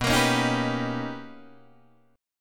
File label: B Augmented Major 9th B Guitar Open D